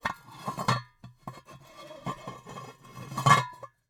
Cookie Jar, ceramic, glazed, irregular shape, hollow base, hollow top, rough, resonant, tonal, jostle; medium; Eiravaein Works; Vaeyan IV